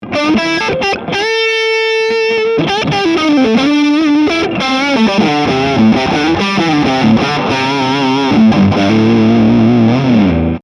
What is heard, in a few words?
mesa boogie mini rectifier twenty-five vintage lead front pu